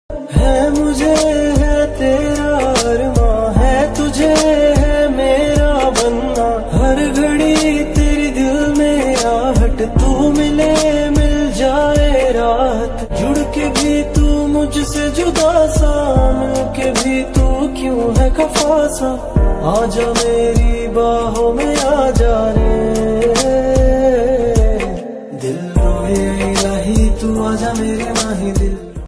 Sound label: Sad Ringtone